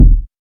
clear kick drum one shot d sharp key, kick drum single shot tuned to the D# note